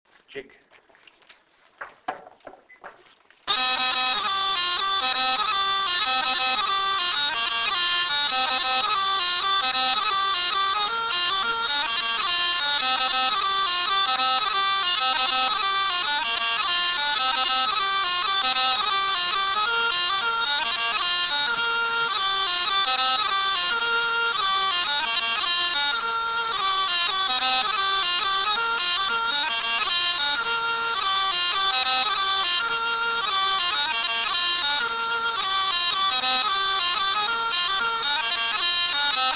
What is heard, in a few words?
Paddies Leather Breeches